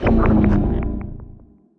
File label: arms race level down